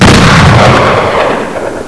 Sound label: 44 mag